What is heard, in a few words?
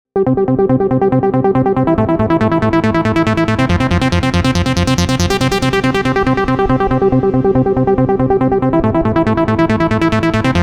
Old Trance; abx3 trance presets vol3